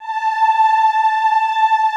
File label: AHH A4, Classical Choir; Best Service; Extended Classical Choir